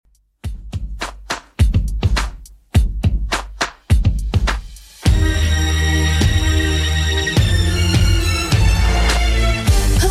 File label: 2ks Redrum 104bpm; remixplanet